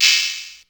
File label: Open Hat, Ensoniq ASR-X Pro